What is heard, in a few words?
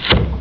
sliced; Prince of Persia